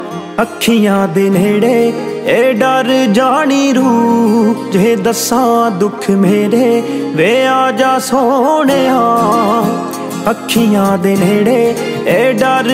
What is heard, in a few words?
New Punjabi Songs Ringtones